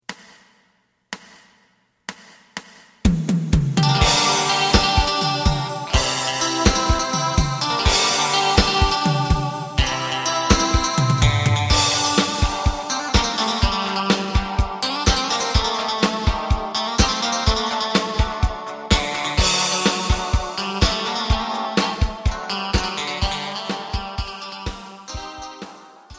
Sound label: bass